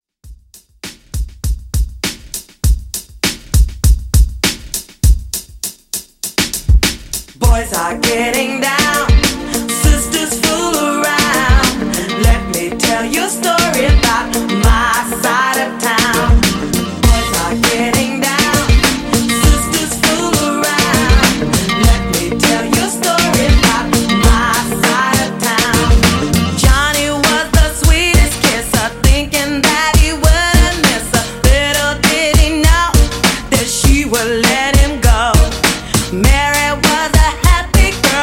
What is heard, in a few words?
90s, 100 Bpm